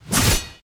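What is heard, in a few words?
sword stuck ground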